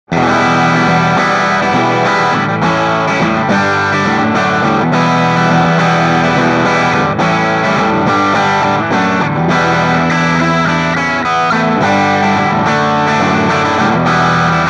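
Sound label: Crunch Bridge, guitar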